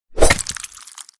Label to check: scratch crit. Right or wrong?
Right